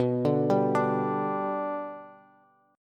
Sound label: B Minor Sharp 5th, Bass; arpeggiated; JGuitar